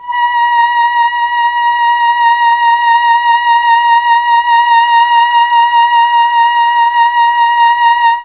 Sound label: A#3 FEMALE, Mellotron; CHMB FEMALE; Mike Pinder - Mellotron Archive CD